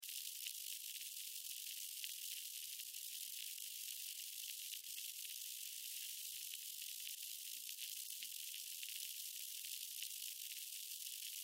Sci Fi Static, Sci Fi Static Monitor Hum Buzz Radio; Loop; Communication